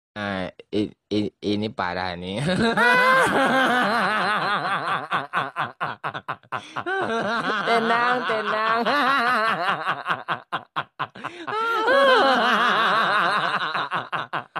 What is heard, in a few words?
haha parah